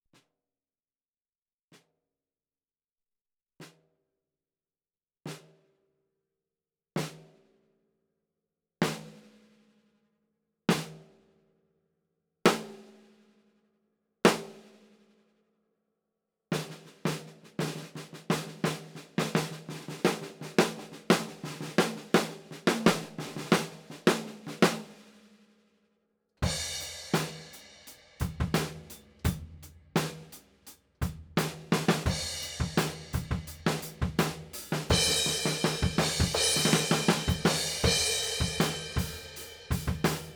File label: Rode NT5 overhead left low; Low tuning